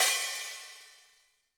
HiHat & Crash, Roland TD-20; HiHats

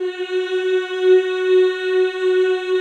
CHO F#3, Aaahh Yhh; Sound & Vision - Gigapack I CD 2 (Roland)

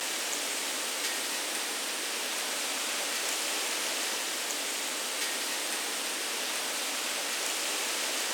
FX Midnight Rain; Zero-G - Future Wave

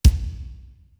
REV KICK, DRUMS; Greytsounds VOL-1; T1 DRUMS

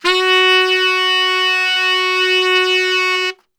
F#2 SAX STR

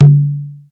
conga lo, Yamaha TG-500

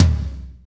tom, fast shuffle, Roland; Northstar; Drumscapes Roland; Toms

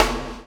CHAIN; Spectrasonics - Backbeat

